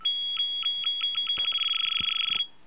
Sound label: nokia3510i bounce ringtone